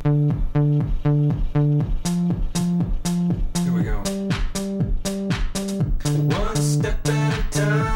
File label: Delay 300